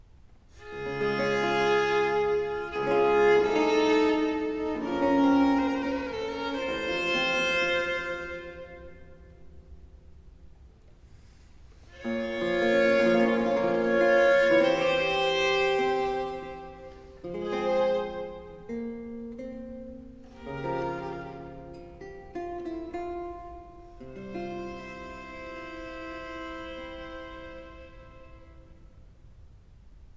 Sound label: Sonate 2 in G minor - 3. Grave; Arcangelo Corelli; Corelli - Complete Works; Klassika